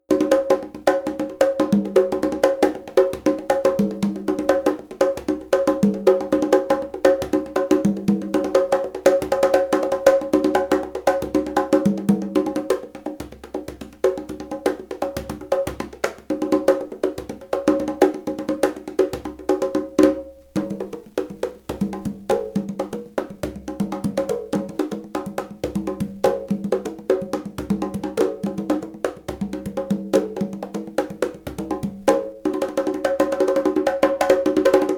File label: conga set black